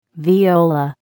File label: viola